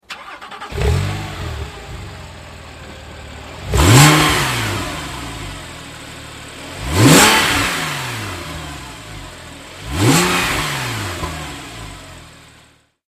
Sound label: 911 start engine